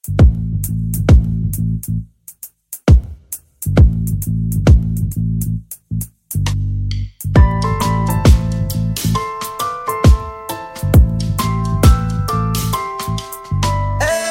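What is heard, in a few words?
background Tone Telugu Ringtone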